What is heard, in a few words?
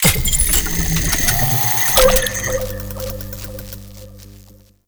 Sentient Op Link Deploy; Squad Link